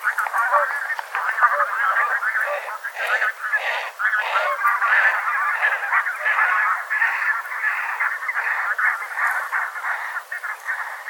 Sädgås

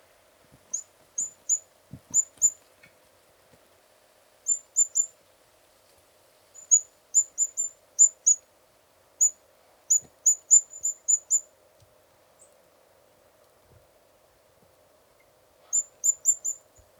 Brandkronad kungsfågel

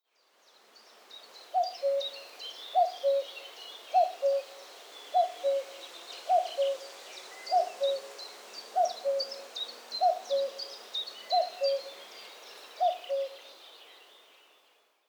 Gök

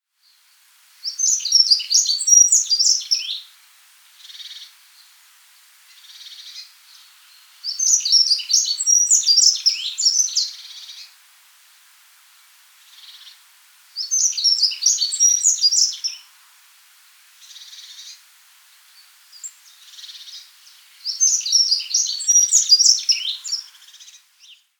Järnsparv

